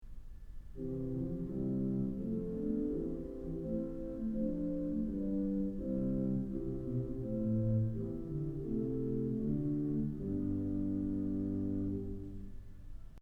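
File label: Principal Flute, organ stops; Kellogg Auditorium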